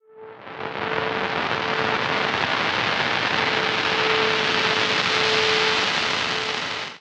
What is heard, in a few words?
FX A Minor 137 bpm; Ableton; Factory Packs; Skitter and Step; Night Tube